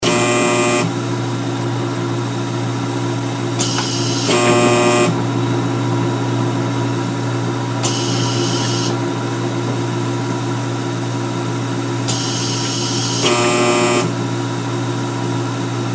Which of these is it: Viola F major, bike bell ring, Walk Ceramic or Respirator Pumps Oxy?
Respirator Pumps Oxy